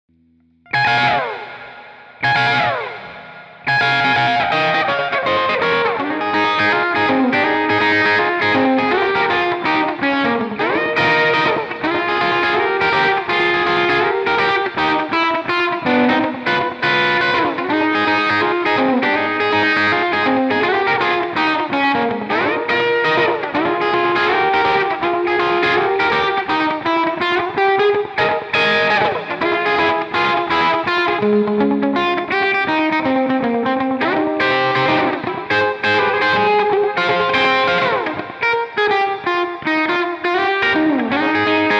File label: Melody Guitar